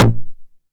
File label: 909 SD Tape Sat